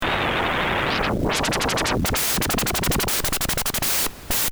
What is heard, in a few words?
noises